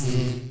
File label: Zombie